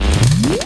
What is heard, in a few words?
reload, paintball; paintballav